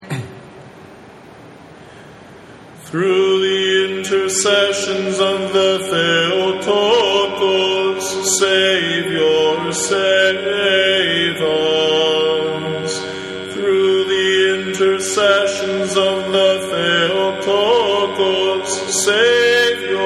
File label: 1st Antiphon, divine liturgy